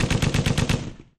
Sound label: Heavy Machine Gun, bursts